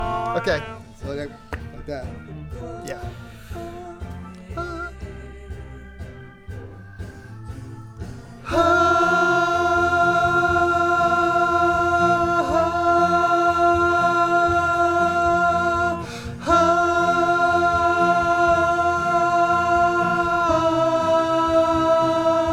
VOX Back

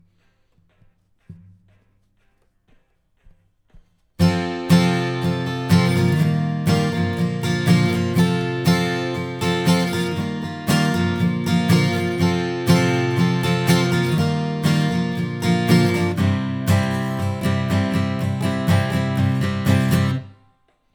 Ac GTR

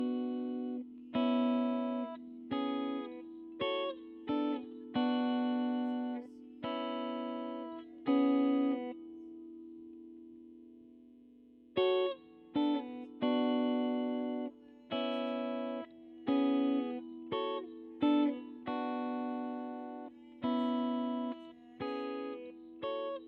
GTR

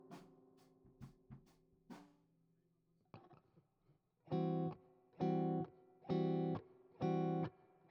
GTR

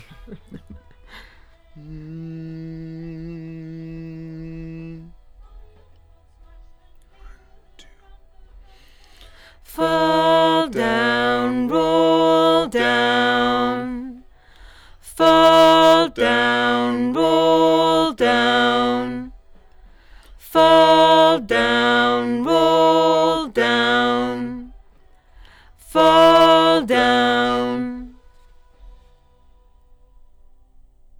LdVOX Double

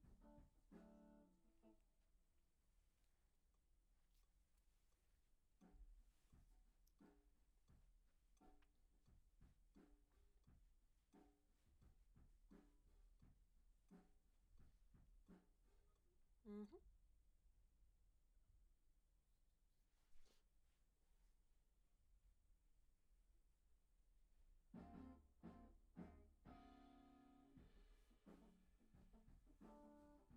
Act Guit R

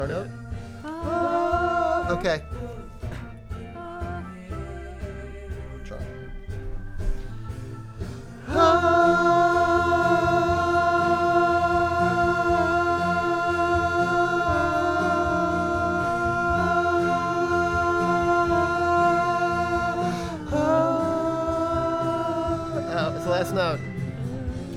VOX Back

